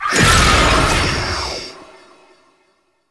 Unyielding Gallop Cast; Warframe; Dagath

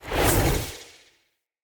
Staff Caster Cast Heavy; Weapons; Player; Staves; Starter

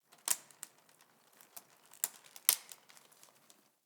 Amb Dead Tree Texture; Ambience; Mendicant Area

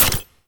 Jet Turbine Pistol Mech

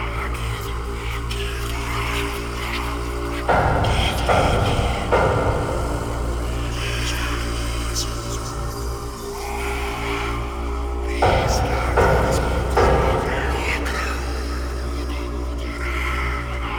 Back Room Loop; Warframe; Infested Eris

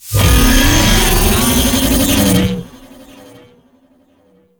Jackal Roar; Warframe; Cinematics; Jackal Redux

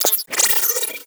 Computer Beeps; Warframe; Guild

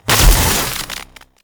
Gun Shot; Warframe; Weapons; Thano Tech Arch Gun

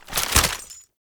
Belt Fed Rifle Reload

